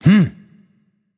loud sound; smite; voicepack; osiris

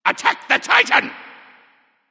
vox attack; smite

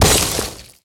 hit ice; smite; god merlin

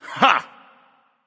yay; smite; arthur

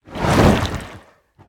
cyclone; smite; poseidon

